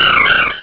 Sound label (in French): Cri